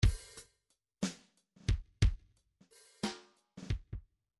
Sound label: midi file grooves 3 4